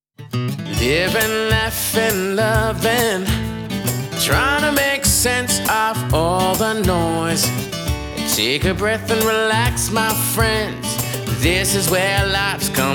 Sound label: Podcast Intro to show Jingle